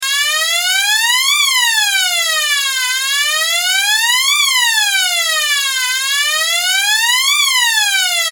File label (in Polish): ton